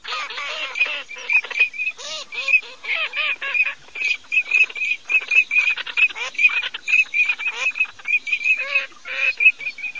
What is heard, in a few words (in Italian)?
alzavole, birdsound